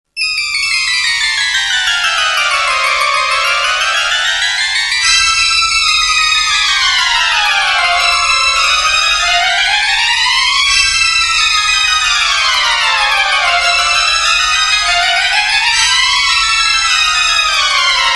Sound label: Spooky Tone